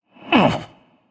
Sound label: grunt lift; smite; gilgamesh